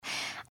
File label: breath; pony; Twilight Sparkle